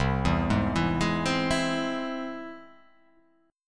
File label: C Augmented down